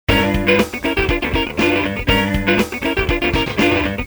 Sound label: Loop